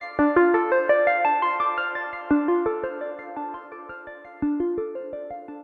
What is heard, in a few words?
synth lead; hip hop beat battle; loosely rock themed